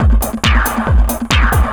138, Techno, drum loops

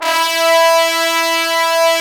BRS TBNS, Bone Sec ff; Roland L-CD702; Bone Sec.FX

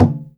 GENDANG G2, GENDANG DRUM; Spectrasonics; Heart of Asia

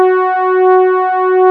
PAD PAD; Best Service Gigapack 1

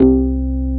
Korg CX3, C 1

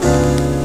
Chord; Mo' Phatt; Digital Sound Factory Mo' Phatt

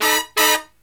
SLEEP, Killer Brass Riffs

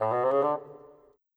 Bassoon, Orchestral; Sonic Foundry (Sony Creative Software); Orchestral VOL-2; Modern 13

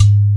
Ghatam Open; Planet Earth; Digital Sound Factory Planet Earth

